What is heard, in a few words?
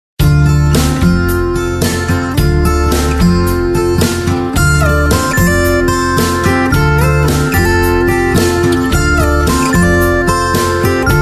Acoustic